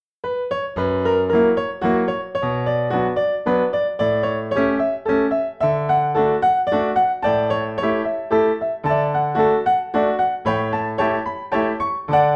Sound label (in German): Walzer; Schubert; D783